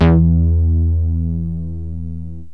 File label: mg bass, yamaha rm1x synth bass and lead